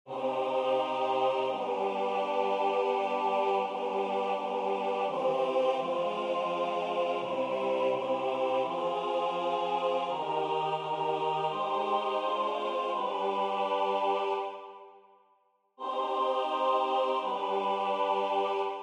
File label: in monte oliveti SATB; croce